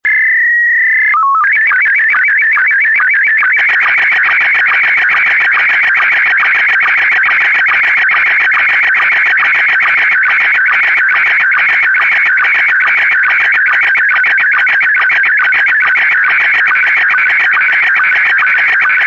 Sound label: sstv scottie s1